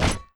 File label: heavy walk; garrysmod